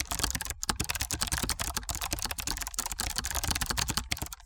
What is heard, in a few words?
gamer typing